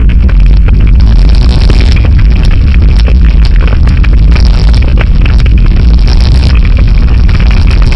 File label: rust burn right, ambience